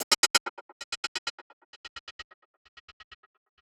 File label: Gated Cymbal; musicradar